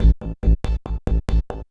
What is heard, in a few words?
Industrial 140